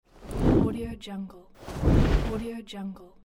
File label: blanket